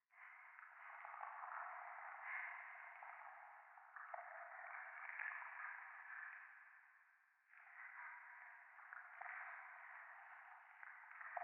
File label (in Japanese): 廃墟 左耳快感吐息 触手